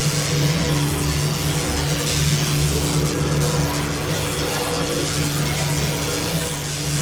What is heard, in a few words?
accel gate loop; LoL; jayce